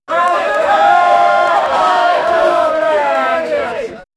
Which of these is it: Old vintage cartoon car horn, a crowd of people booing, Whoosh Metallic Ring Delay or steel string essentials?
a crowd of people booing